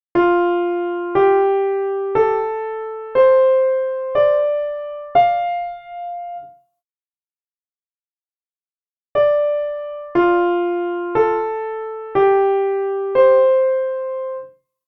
Hard Melody

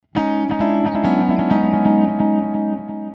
Tape Delay

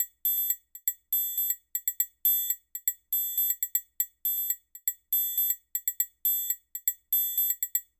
world triangle

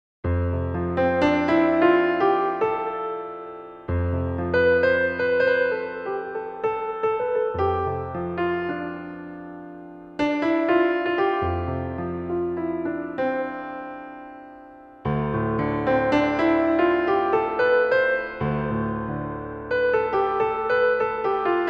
Modal Improv

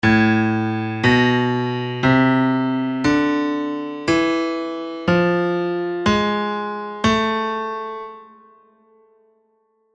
A harmonic minor

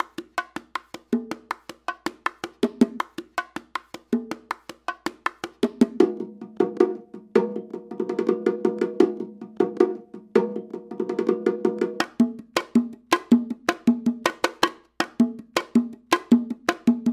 Bongos Sample; Latin Music

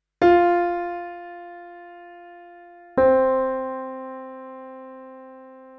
tonic dominant; Hearing Harmonies

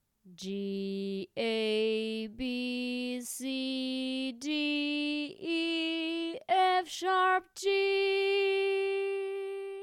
sung scale; Long Long Ago; Scale in Real Notes

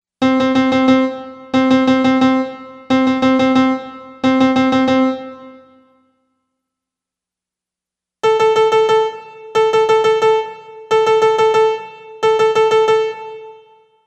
piano

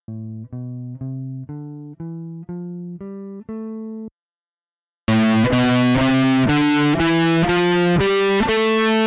Amp Settings

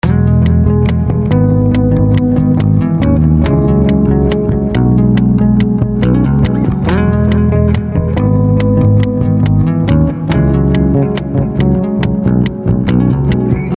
metronome example

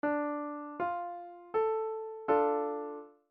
ii Chord ii V I

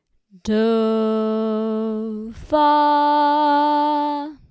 Do fa in A Minor

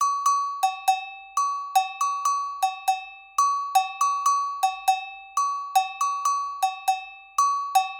World Agogo

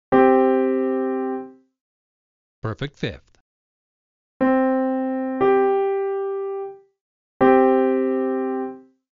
Perfect Fifth Example; interval

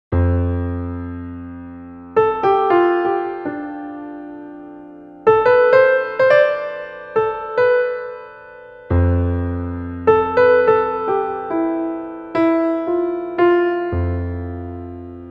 Modal Improv

